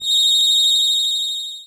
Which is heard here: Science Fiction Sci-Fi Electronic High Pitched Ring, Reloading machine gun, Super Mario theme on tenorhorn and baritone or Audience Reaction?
Science Fiction Sci-Fi Electronic High Pitched Ring